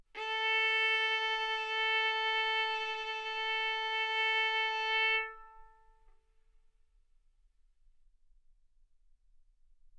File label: Violin arco mf sul A A4 B4 stereo